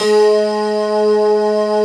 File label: D50 Layer, Roland; 90s Sample CDs; Club-50 - Foundations Roland; D50 Syns